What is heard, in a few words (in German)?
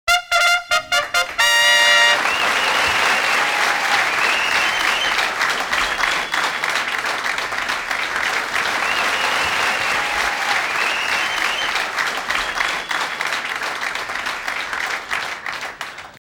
applaus